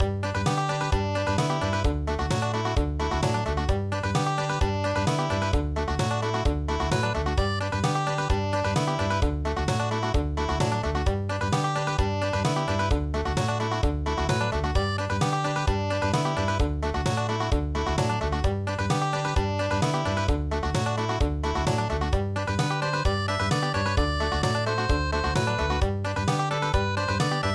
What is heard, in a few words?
Country